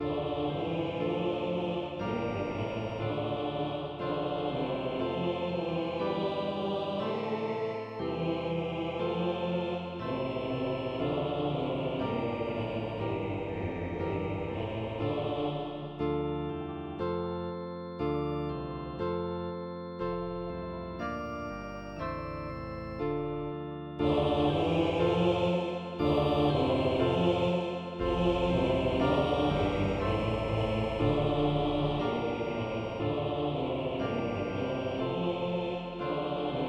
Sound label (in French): Kyrie avec invocations prealables, Audio a 4 voix; Messe de Verrieres; Partition a 4 voix